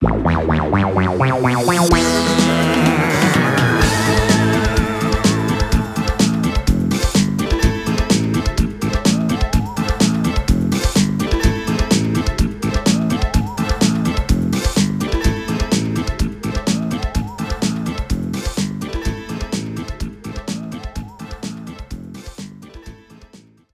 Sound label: battle intro; Yokai Watch 2